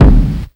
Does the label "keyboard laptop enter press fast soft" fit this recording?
No